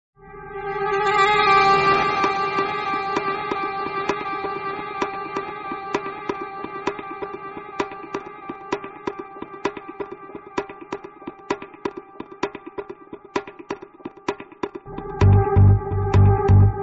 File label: dance music ringtone